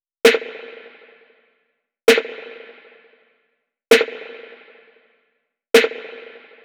Drum Loop 131 BPM Snare; Loop Stems; Cymatics; Vibes II Drum Loop Collection; Temptation

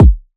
Kick - E; Drum One Shots; Cymatics; Cobra Hip Hop Sample Pack

